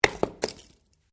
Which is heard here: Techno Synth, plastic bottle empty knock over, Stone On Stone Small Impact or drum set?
Stone On Stone Small Impact